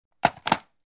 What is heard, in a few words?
Hang up the phone; telephone